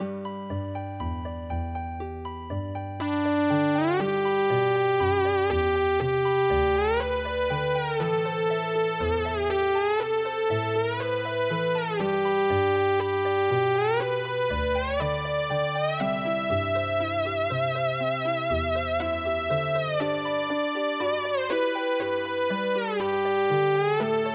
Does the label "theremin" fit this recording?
Yes